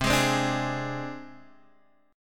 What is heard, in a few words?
C Suspended 2nd Sharp 5th